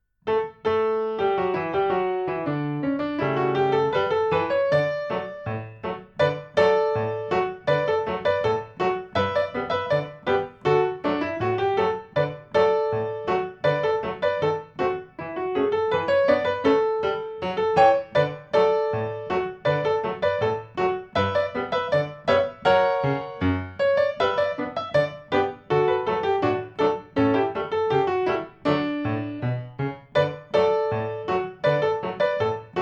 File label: Ragtime